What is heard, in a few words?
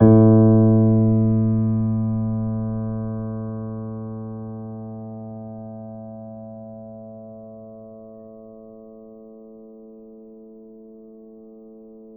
Grand Piano A1 p; Ableton Live 9 Essential Training